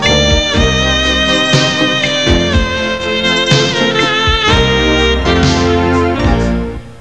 sax fax

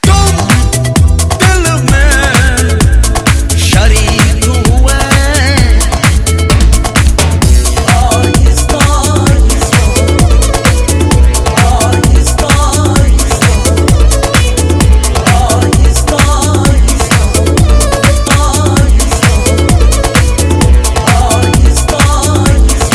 Remix; Ringtones

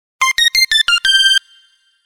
SMS MMS Alert

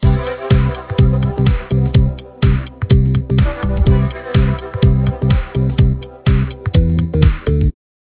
ringer; Ringtones

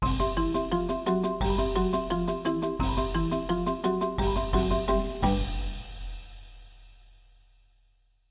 ringer; Ringtones

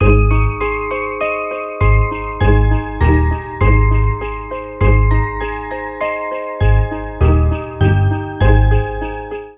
ringer; Ringtones

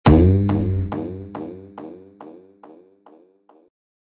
ringer; Ringtones

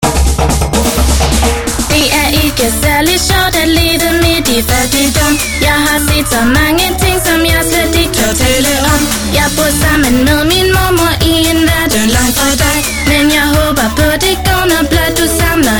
tv spot musik intro

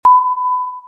Sonar ping

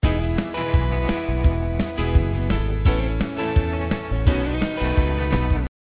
ringer; Ringtones

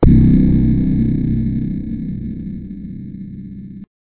ringer; Ringtones

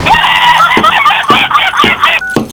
Curcuit Tone; Ringtones

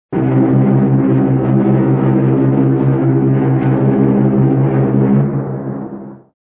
siren; titanic

